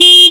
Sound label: Car Horn VW Beetle; Hyperdrive Massacre; Sluggers